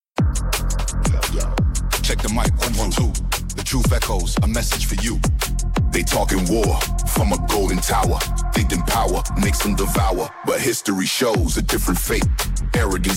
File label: Iran rap